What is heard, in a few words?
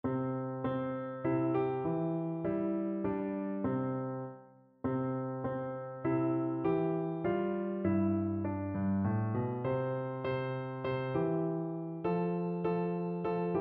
labe igi orombo piano